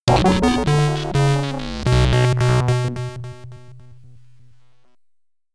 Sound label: Game Over (SID Stereo); Jars Revenge; Commodore 64